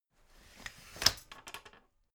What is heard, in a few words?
folding chair fold medium